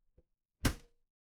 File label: impact slam